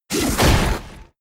Block; Pokemon; Gen 5; Blk Wht Blk2 Wht2

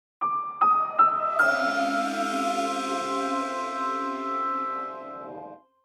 Get Fanfare; the legend of zelda tears of the kingdom o.s.t switch gamerip 2023; Decayed Master Sword

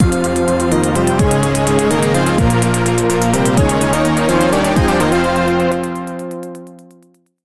Item Jingle; A Robot Named Fight

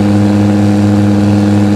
Urus High

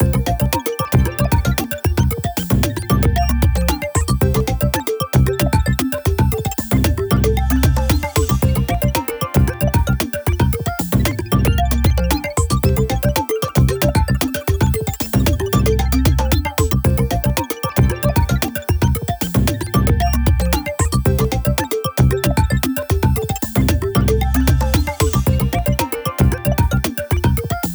Jingle Vending; Taiko no Tatsujin Tokumori; Wii U

